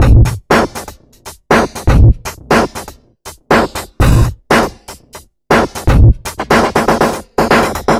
groove hip 120bpm, Downbeat Dance Grooves

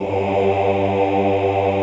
OHH G1, Classical Choir; Best Service; Extended Classical Choir

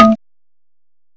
MARIMBA, MALLETS; M8; CMI Factory

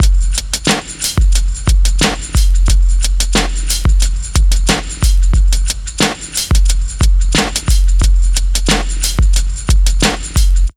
90 BEAT, Pure Electro; USB Soundscan vol.03; AKAI

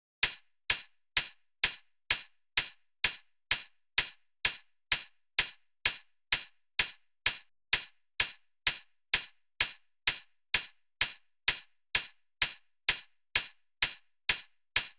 loop hat 128; Seismic Loops

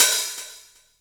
hi hat open, Korg Electribe ER-1; Kit 4

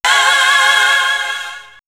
Aah; X-Static Goldmine; house&rapvocals

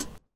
KIT, Dance Mega Drums, HIP; Best Service

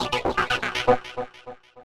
NRG Arp, trance

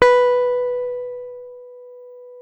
B 3 JAZZ GTR; Univers Sons; Jazzistic

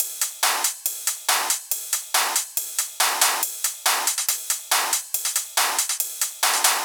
drum Loop 140BPM Full

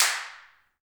handclaps, Heart of Africa

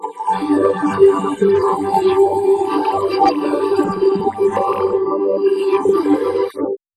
wooden shovel G, pad

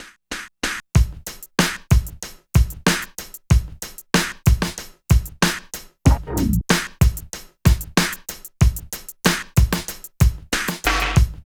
LOOP, Phat & Phunky; East West - Phat & Phunky CD2; 90's Sample CDs

